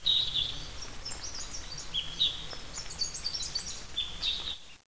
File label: ATMO